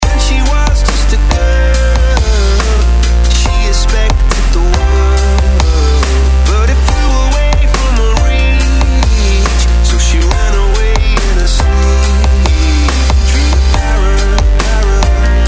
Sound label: dubstep remix